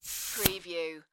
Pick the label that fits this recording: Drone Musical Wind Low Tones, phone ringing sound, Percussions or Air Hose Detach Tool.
Air Hose Detach Tool